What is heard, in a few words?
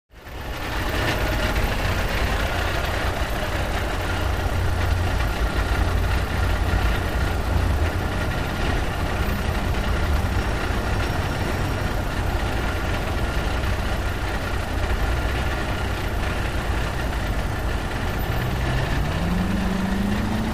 Truck Interior Open, interior